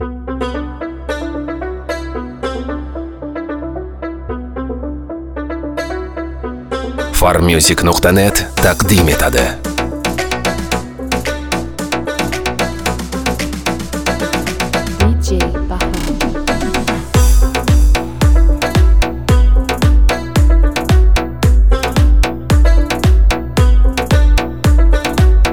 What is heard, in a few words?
turkish club mix